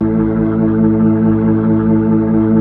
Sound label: B-3, Full Xfade; Roland LCDP10 Keys of the 60s and 70s 2